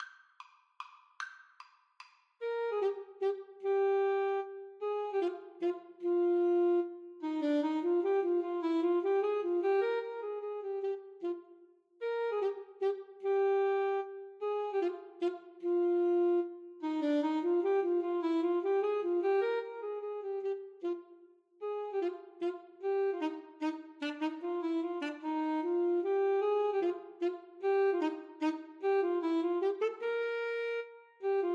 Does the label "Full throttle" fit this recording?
No